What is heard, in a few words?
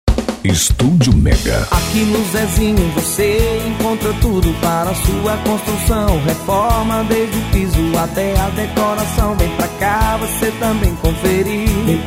JINGLE; ZEZINHO CONSTRUÇÕES